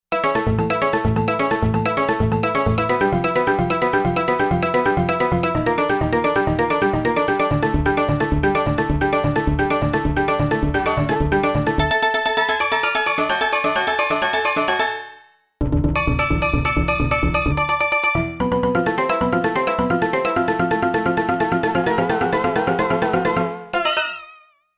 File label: Dancing Mallets